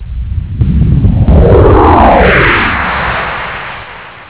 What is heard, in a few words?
ufo fly